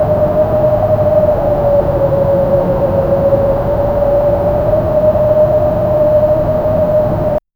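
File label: a distant soft wind blowing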